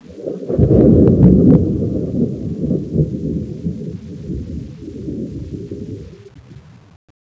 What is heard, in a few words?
thunder faraway